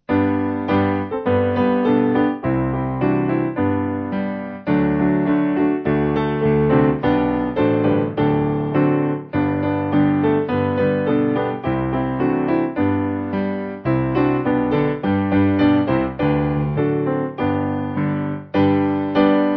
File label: piano